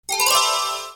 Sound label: BUTTON; buttons